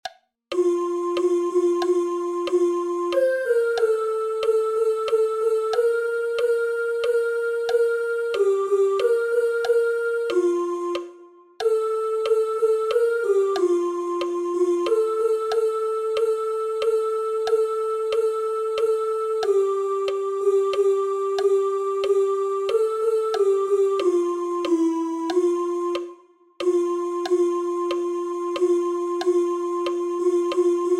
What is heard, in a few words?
gloria tempo 92 altos; haydn ii